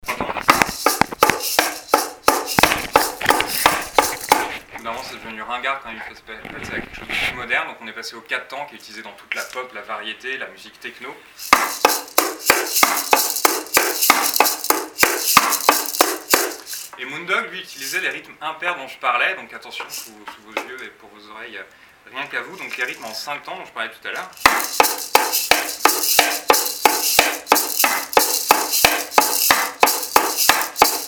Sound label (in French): Rythmes